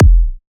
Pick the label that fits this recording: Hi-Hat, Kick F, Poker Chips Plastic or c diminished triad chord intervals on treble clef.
Kick F